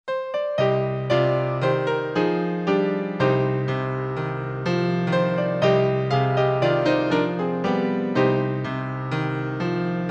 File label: C Minor